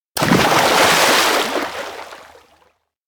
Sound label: lake enter; anemoi